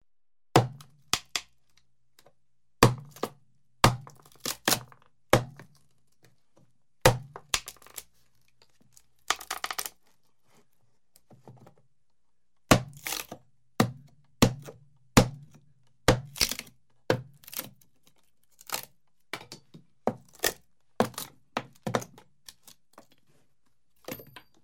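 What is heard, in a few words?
carpentry work separating two nailed boards hammer knock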